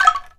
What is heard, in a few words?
ANKLUNG